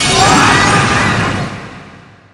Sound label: explosion; mio jihad; siege